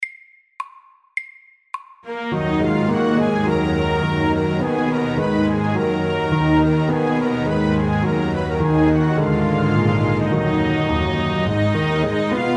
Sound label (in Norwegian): Old French melody FORT